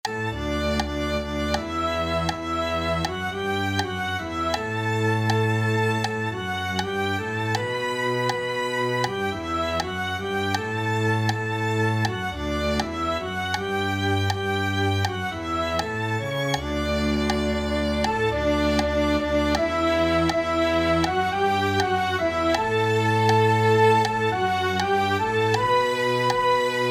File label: clic; Cantemos, Cantemos